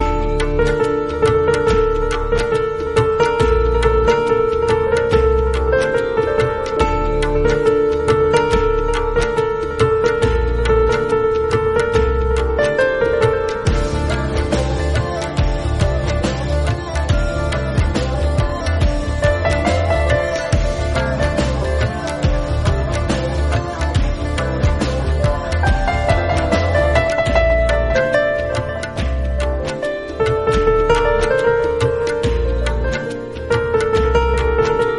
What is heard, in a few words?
instrumental